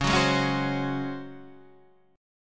Db Major 7th Suspended 4th Db down Guitar Standard; strummed; JGuitar